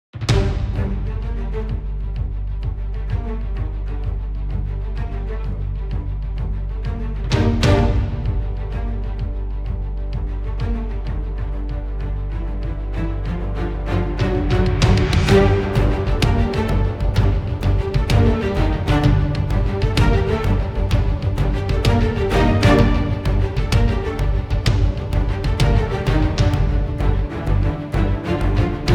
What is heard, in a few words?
combat